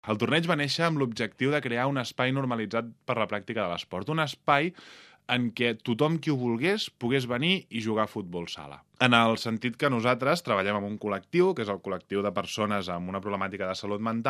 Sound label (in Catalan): Trilles